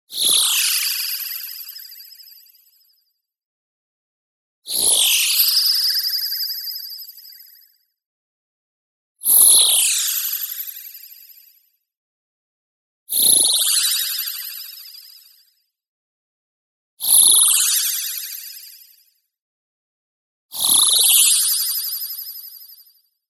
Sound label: movement fast, molecule flutter screechy; boom library; Anime Essentials; DSGNMisc